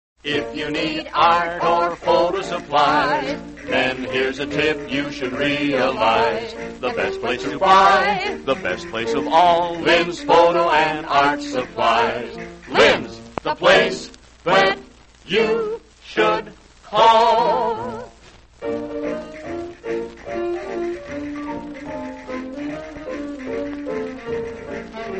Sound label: radio jingles; lynns photo and art; allied radio artists